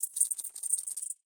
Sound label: Env Void Grasshopper